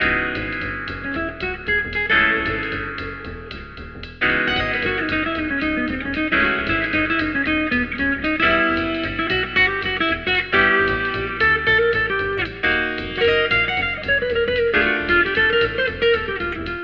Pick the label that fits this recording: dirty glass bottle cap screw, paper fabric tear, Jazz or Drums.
Jazz